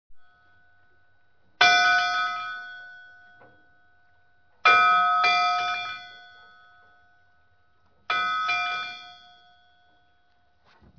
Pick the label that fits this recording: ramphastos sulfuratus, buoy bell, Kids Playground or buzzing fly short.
buoy bell